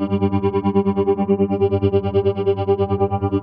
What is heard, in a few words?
Lap Steel Clean 140 G, guitar drone